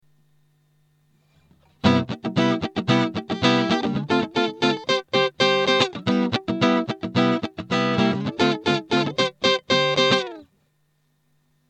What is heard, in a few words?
Neck Chords Squier, guitar pickups